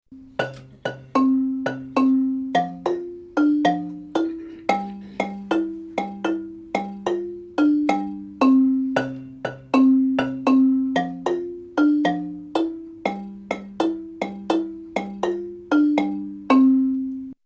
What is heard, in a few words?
tenor, marimba; second tenor; main; babamudiki